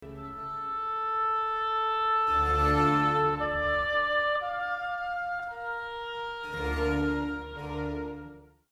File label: Min chords